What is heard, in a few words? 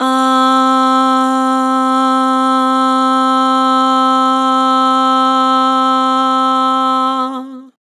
Vibrato "Aah", Vocals; Harmor Vocals